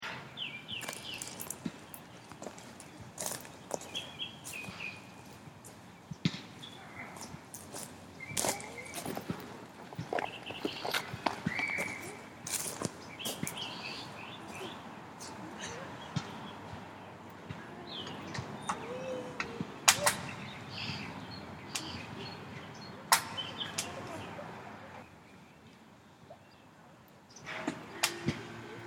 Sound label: park playground soundscape; El Barrio